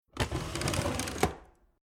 Household Draw Old Wooden Cabinet Draw Small Slide Out Creaky; Drawer Sound Effects